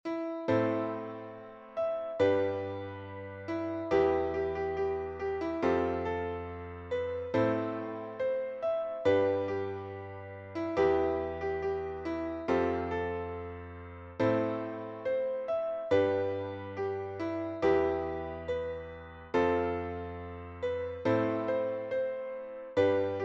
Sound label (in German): piano